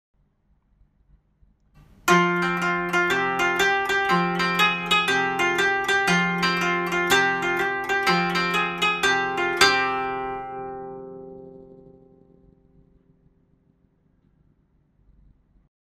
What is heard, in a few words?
koto